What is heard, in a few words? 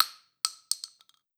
casing 12ga; arccw; weapons